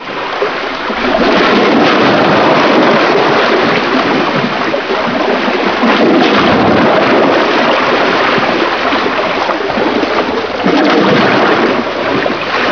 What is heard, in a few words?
waves; PC Gamer IT CD 41; Daikatana